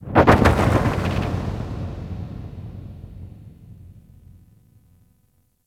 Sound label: Thunder Large; Warframe; Ambience; Cephalon